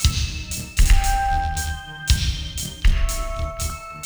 BEAT, Hip Hop

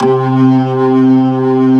C3, Keyboards Old School; USB Soundscan vol.09; AKAI; BIG HAMM

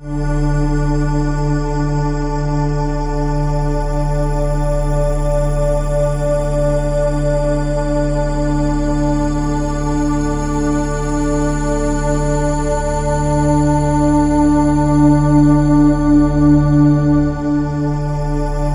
D TURN, SYNTH SND, Ominous Drones; USB Soundscan vol.48; AKAI

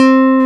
FM Chimer; 90s Sample CDs; Club-50 - Foundations Roland; FM Syns

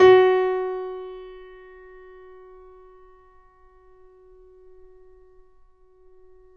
Mf F#3 off, Grand Piano off mic; AKAI S6000 CD-ROM - Volume 2

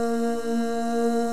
MIXED CHORUS

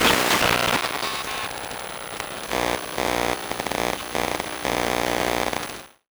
Noise, Pick Up Coil Noise; contact mic madness

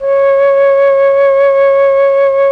FLUT1; ILIO; Propeller Island Legendary M400

